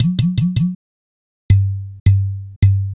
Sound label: Udu